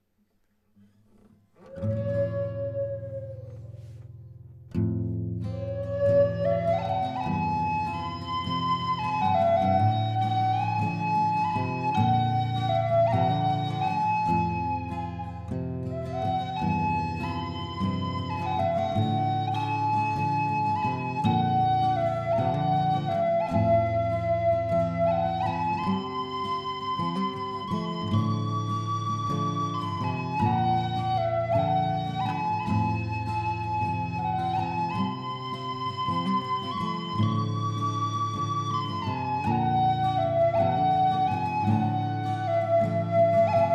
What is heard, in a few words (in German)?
Ast Holunder D hoch 432Hz